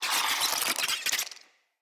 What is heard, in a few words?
Infested Ashen Vocal Attack Death